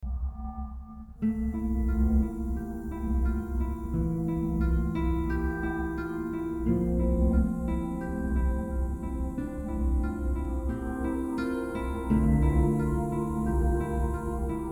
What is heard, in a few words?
Menacing